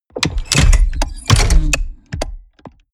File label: mechanics large lock squeak; industrie-wirtschaft; mechanik